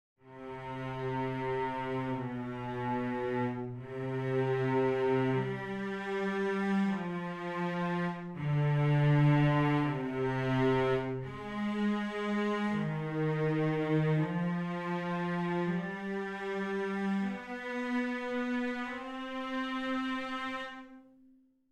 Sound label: Cellos